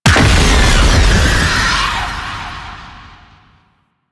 Octopede Wail End Burst; Warframe; Man In The Wall

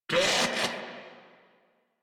Laugh Ghoul Sarge, Barks Battle; Grineer Ghoul Drill Sargeant

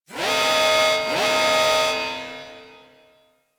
Mobile Defense Alarm Activate; Missions; Railjack

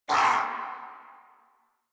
Pain Grineer; Warframe; Grineer Chem Nox

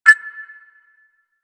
Hub Concourse Computer Beep

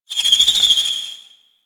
Rocket Launcher Proj Whizz By; Enemies; Warframe; Kela De Thaym

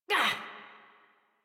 Pain, Adult; Warframe; New War; Adult Operator

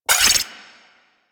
Igniter Pistol Mech; Warframe; Weapons; Corpus

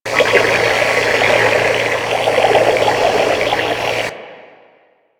Liquid Wire Loop; Warframe; Ambience; Corpus

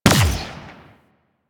Arachnoid Wraith Multi Gun Fire; Warframe; Corpus; Abilities

